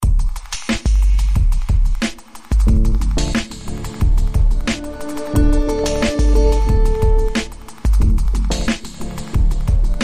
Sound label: Beatcraft Drums